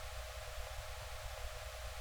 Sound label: ORG Farfisa Flts; Flt16'; Keyboards of The 60's and 70's